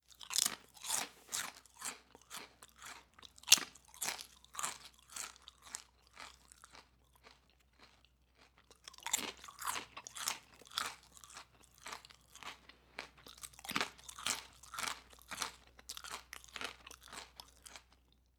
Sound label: Potato Chip Crunching